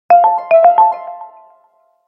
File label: Alert